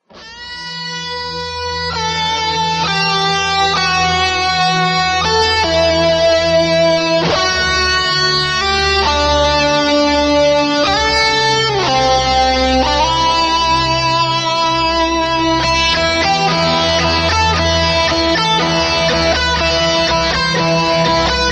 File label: Guitar Solo; The Crow; Instrumental Rock